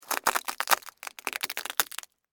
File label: Crack Crunch Slow, Texture, Crack, Crunch, Slow, Penne, Rigate; Blast Wave